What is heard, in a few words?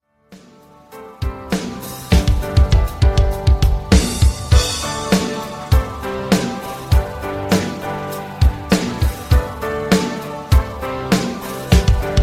iphone ringtone